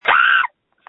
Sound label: Scream